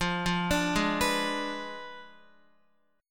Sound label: F Diminished 7th, Guitar; x,8,5,7,8,7; Fdim7 arpeggiated; JGuitar